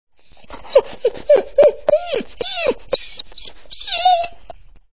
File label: dog whimp